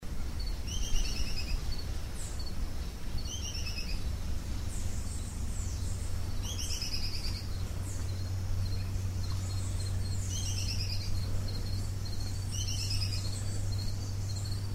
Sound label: scaled antbird Drymophila squamata hopping excited in undergrowth by river